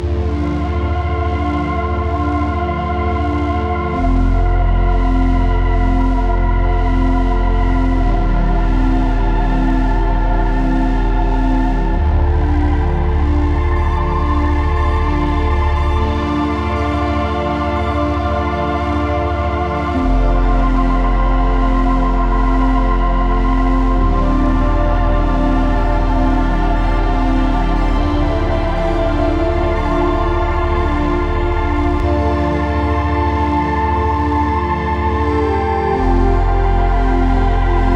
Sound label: Shop Music